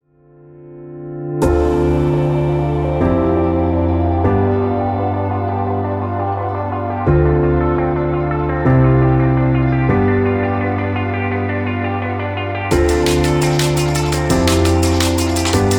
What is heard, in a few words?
Uplifting Drum And Bass; generic